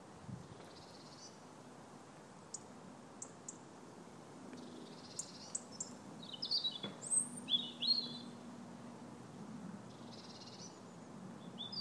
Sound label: birdsong right now, redland